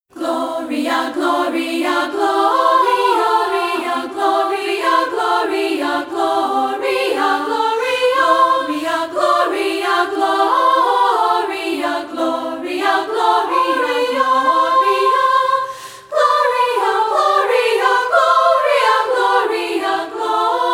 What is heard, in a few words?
Gloria A cappella